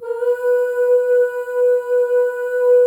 OOSC, FEM OOS, Voices

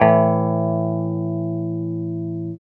guitar 5TH

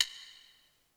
Sticks, Percussion rock; Giga Samples Collection; ELQ-DrumQit; rock toms

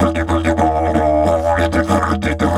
Didge 93 BPM, Didgeridoo

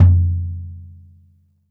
bop kick - snares off; JazzFunkKit

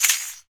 RAKATA, Afro Shakers, Ankle Pods

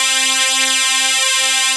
RICH WAVE

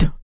HIT, Jazz FX FEM PERC

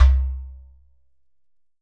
GHATAM

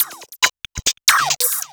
percussion loops 138; PS-45; Techno

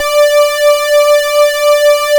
X TRA D6, X TRA SYN

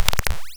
Silver BBD Zap Mod, drum machine; 300 Drum Machines; DevSnd

